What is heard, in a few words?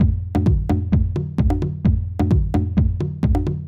Beat